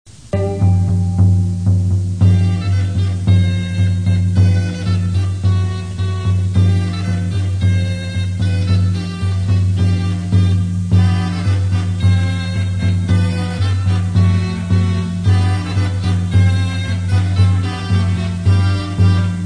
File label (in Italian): tamburo